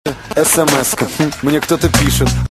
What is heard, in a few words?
notification ringtone